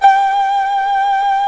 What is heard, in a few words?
ERHU G4; INIS - Opium